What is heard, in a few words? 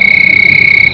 CHIRRUP; ANIMALS